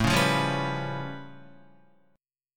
A Augmented 9th A, Guitar; strummed; JGuitar

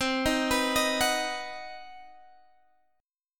C Minor Major 7th Flat 5th C down Guitar Standard; arpeggiated; JGuitar

